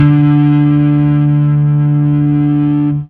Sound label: Fuzz D harm